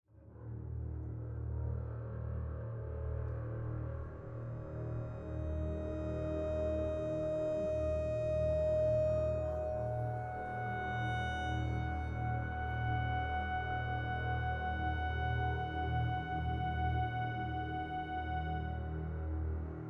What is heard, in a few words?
dark threat